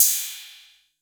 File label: HIPHOP KIT CYM; Wizoo - Platinum 24 Electronic